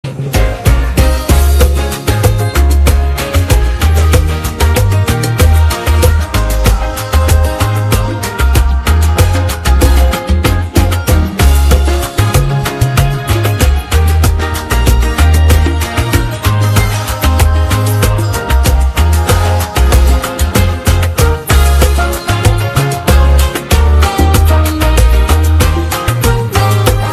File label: Instrumental